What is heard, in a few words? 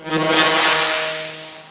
lightning walk